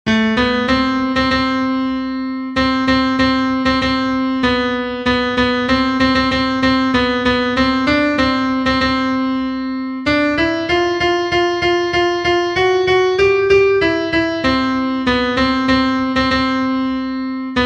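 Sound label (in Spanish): CONTRALTO, himnos en piano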